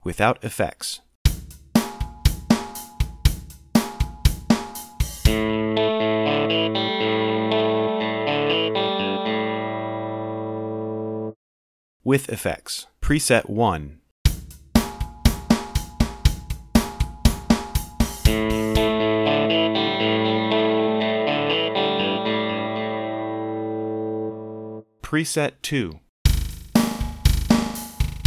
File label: delay; Mixcraft; acoustica